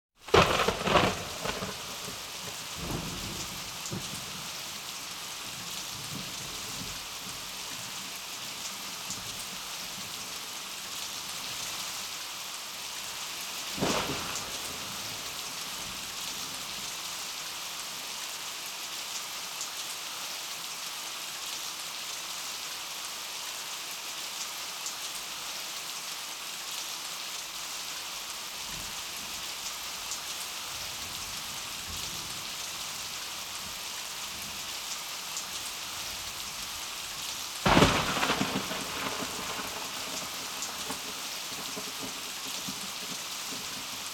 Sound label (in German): sturm donner und heftiger regenguß; Urwald